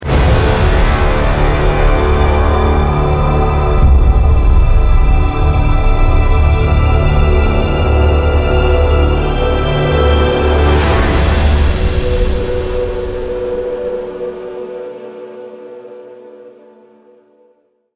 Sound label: Nuke Music Multiplayer